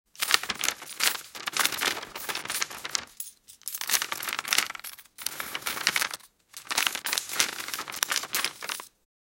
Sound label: money bundles stacking; household